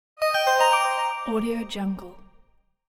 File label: notification